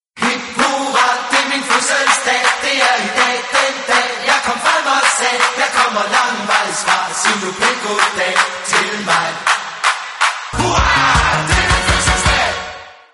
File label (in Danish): Hip hurra